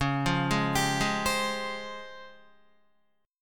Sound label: Db Major Sharp 11th, Guitar; arpeggiated; JGuitar